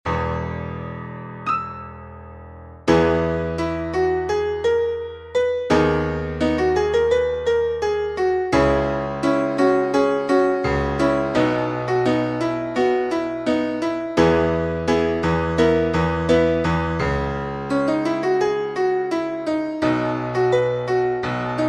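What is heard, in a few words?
piano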